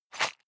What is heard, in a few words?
sundries shake hard; berufe; handwerker; soundpool